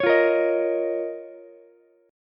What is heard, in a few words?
D sharp 7th Suspended 2nd D sharp, Ukulele; D#7sus2 strummed; JGuitar